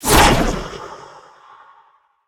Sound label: spear throw; smite; Anhur